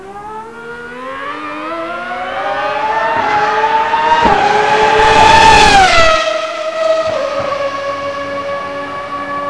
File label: Open Pipe; Symphony